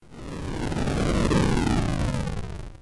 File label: landing menu